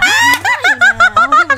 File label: Laugh; Houshou Marine